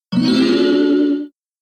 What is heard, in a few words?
Confirm Jingle; Dillon's Rolling Western; Nintendo 3DS